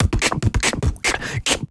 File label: Human Beat Box 140 Pop; Loopmasters